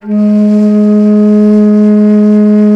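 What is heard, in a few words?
bass bansuri, World Winds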